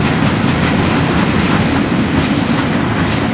railway; Mafie 1

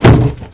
bodyfall; Mafie 1

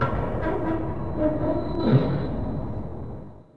rail; Mafie 1

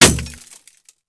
metal; Mafie 1

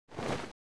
noise; Mafie 1

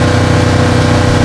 buick; Mafie 1

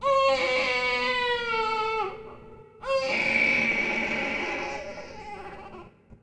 m baby; Mafie 1

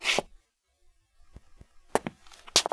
krok soup; Mafie 1

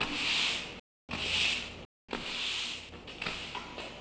sweep; Mafie 1

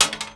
metal; Mafie 1

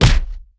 body; Mafie 1

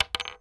wood; Mafie 1

